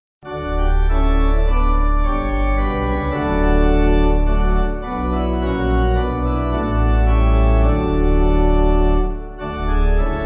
When His Salvation, Pipe; Tours

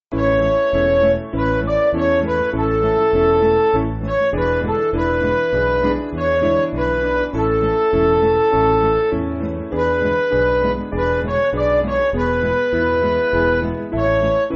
Midi

